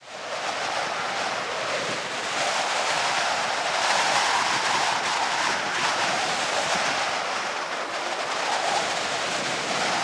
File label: Sierr Wind